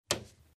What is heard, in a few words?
Putting a ballpoint pen on the table